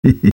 laugh short; sniper

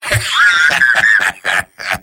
engineer laugh evil; tf2; garrysmod; mvm

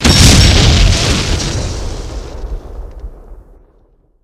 dispenser explode; tf2

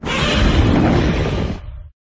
preattack; walking godzilla catnap

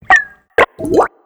chem set add element

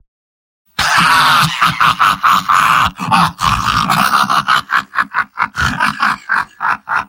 laugh long; spy; mvm

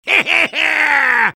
soldier battle cry; vo

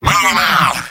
engineer battle cry; tf2; garrysmod; mvm

